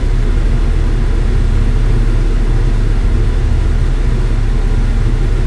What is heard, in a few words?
pipe ambience